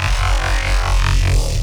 cool bass

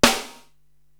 SD Amb. Piccolo, Korg TR-Rack drum machine

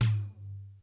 Lo Tom, Korg DSS-1 Drums

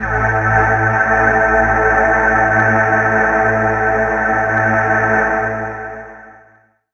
Dark Pad; Zero-G Jungle Warfare 1, 2 & 3; 90 s Sample CDs

